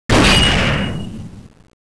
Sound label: door knocker